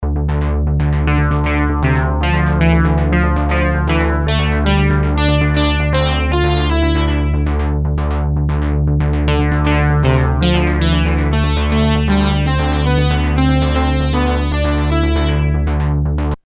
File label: Monopoly Solo Sequenz Korg; Korg Collection